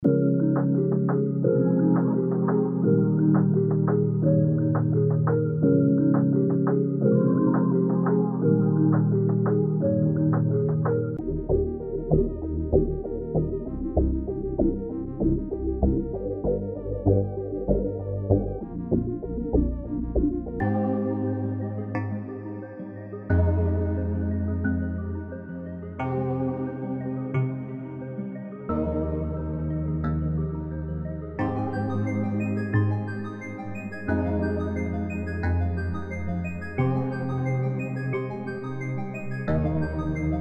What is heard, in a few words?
reggaeton kit